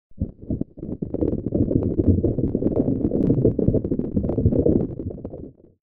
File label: RAIN